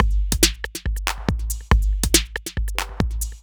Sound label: Break Pattern, Drums